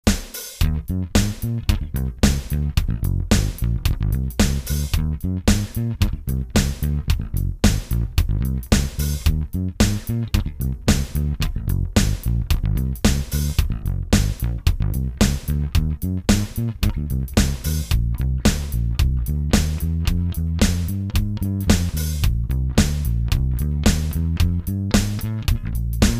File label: Yamaha PB-1